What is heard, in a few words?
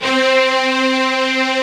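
strings section C3 left; STRNGS; AKAI S-Series CD-ROM Sound Library VOL-8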